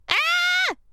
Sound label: Scream; IRyScream